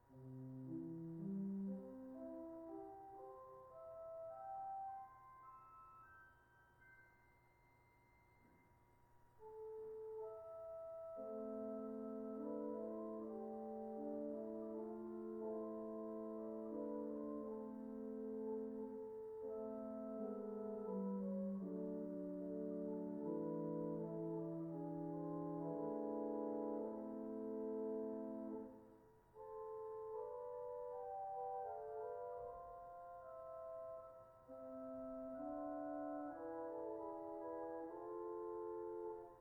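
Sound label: swell spire flute, organ; 8’ Spire Flute; An open flute stop whose pipes are conical in form.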